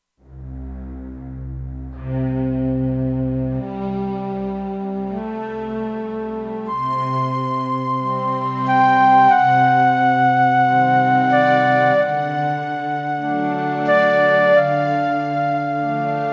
Flute & Slo Str, Kurzweil K2500X; Factory Programs

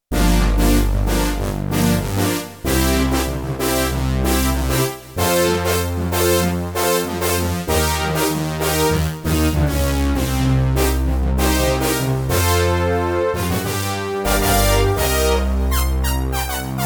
Analog Brass, Roland JD-800; Factory Patches

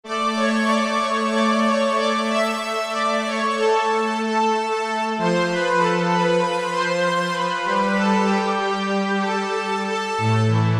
2 voices; Korg MSC-12S Synth 3

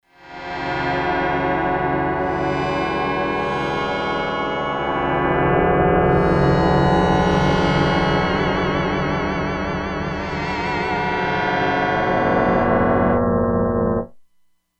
Ascending; Factory Presets; E-mu Proteus-2

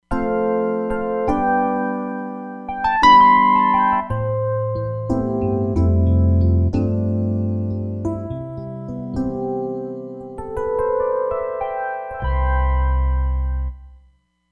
FM EP, Roland Fantom XR

